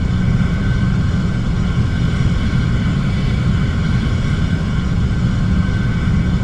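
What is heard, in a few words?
jet cockpit right, engines; War Thunder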